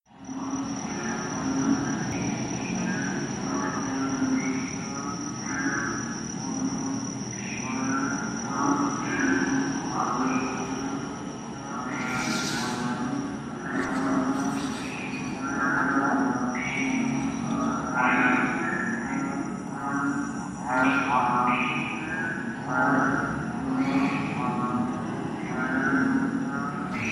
frogs chorus; march

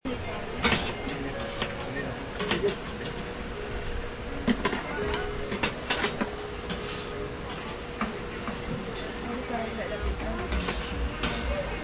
grill cooking sounds

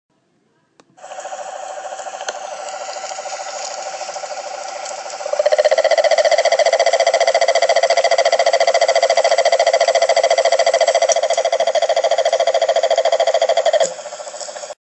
frog rhinella

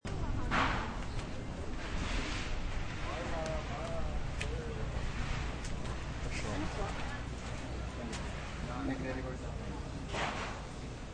construction materials falling and rat

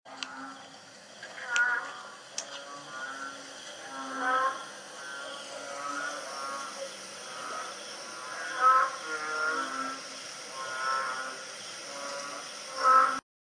frog kaloula

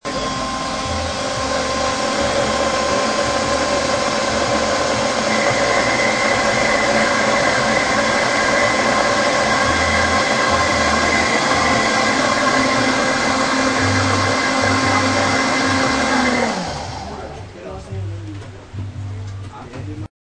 shake

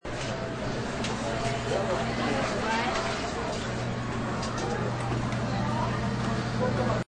caf people eating

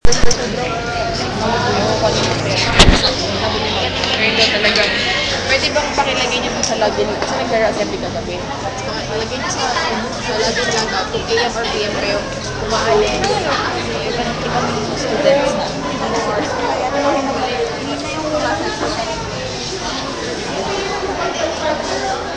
classroom noise no teacher

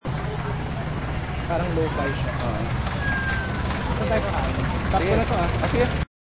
motor running

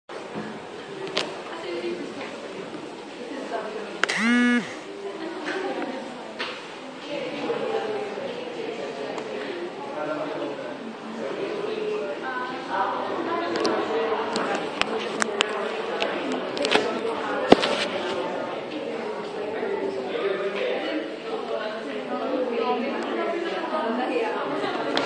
classroom noise without teacher

